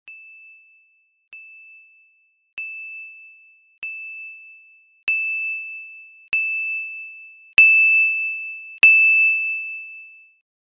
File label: Steel Wake-up Alarm; Samsung SGH-F310 Serenata; Alarms